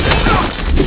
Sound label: laser gun